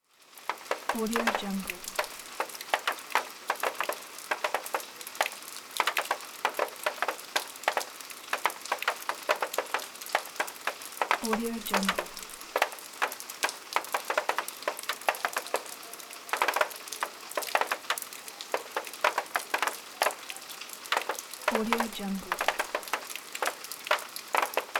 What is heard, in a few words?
rain on the roof